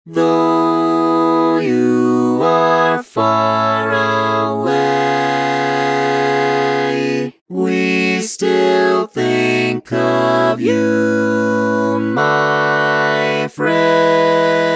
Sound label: All Parts, barbershop